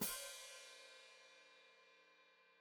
Hat Closed Open, Hi-Hat

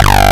Sync G1, lead; Digital Sound Factory Xtreme Lead

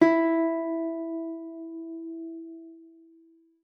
E4, guitar; Xsample VOL-2

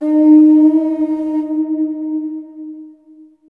AF HRN D#4, AMB HRNFX

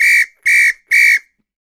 Samba Whistle

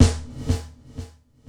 Gated Snare; Univerb; creative gate; Gated Reverb

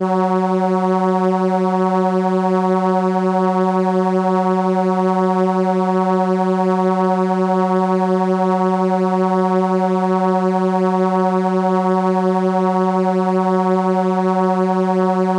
Viola F#4, ARP Solina

